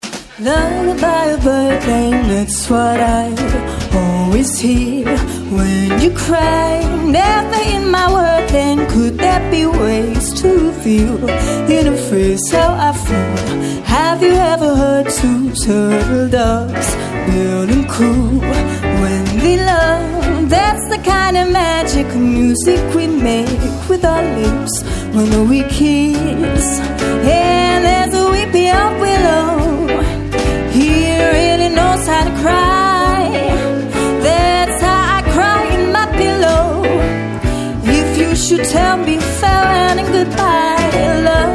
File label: Swing Band